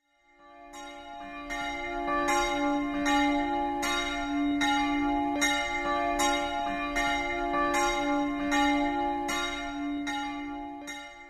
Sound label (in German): lutherische kirche